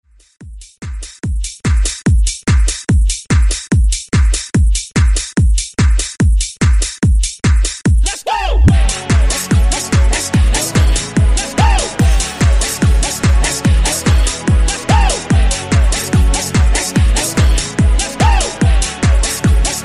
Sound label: Top40 Hype 145 Bpm